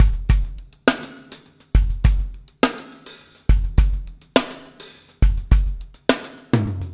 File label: PEAK, Backbeat